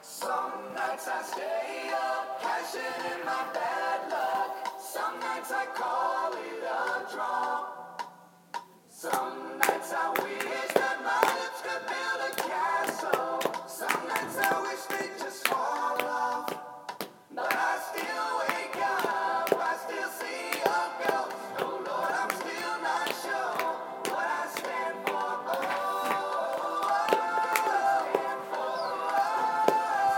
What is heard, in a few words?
clapping backing